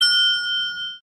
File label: correct